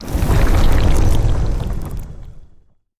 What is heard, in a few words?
horror game magic infect blast instant liquid cast spell